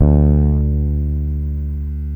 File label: DIRTY BASS, Electric Basses; PlatinumPhattCD2; E-MU Producer Series Vol. 8 – Platinum Phatt